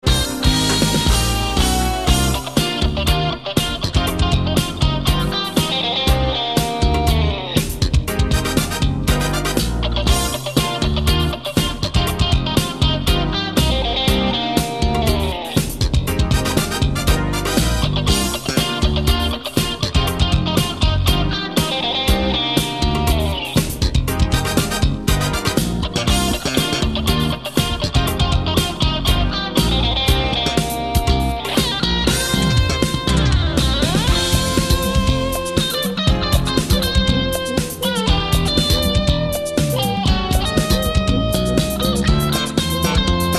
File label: helicopter groove